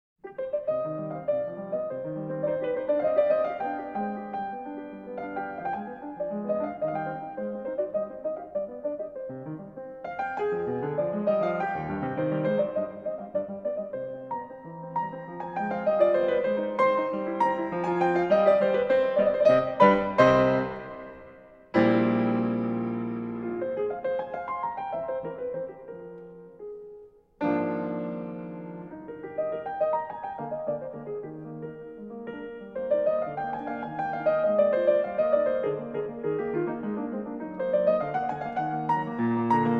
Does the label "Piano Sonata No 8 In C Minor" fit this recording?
Yes